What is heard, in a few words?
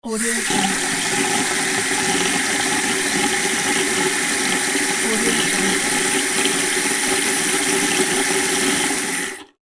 bathroom sink running water; floraphonic